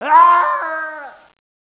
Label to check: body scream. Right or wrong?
Right